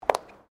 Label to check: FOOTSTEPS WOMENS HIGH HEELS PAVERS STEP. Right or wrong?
Right